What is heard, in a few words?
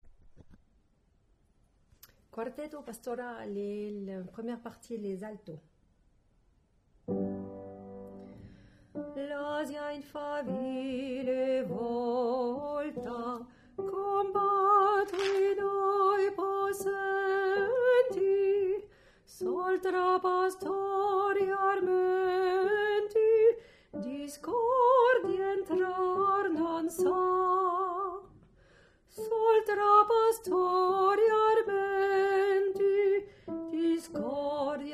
Alto; quartetto